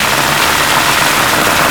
surface tire dirt light loop

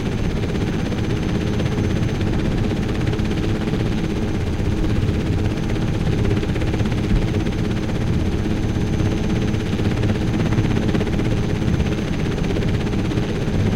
apache engine work onload, tanks; War Thunder

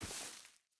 footstep boots grass walk; Rust

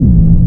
hipcutter mid start

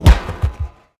damage; World of Tanks

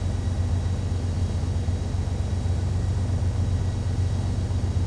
t90a engine int rpm 00 load, engines tanks; War Thunder

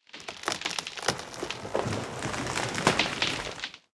tree creak

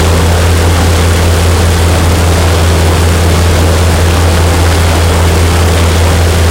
bomber side, engines; War Thunder

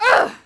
Archer Attk Vox; Civ4 warlords

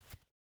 footstep boots concrete jump start; Rust

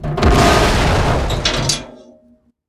objects, World of Tanks

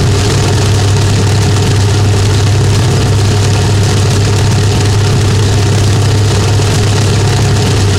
panzer6 engine rpm 66 load, tanks; War Thunder

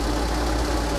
airbrake loop

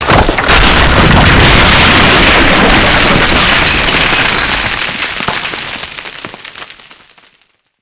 blding crumble; Call of Duty Modern Warfare

